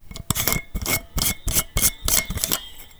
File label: unscrew bulb; OneShot Fading Memory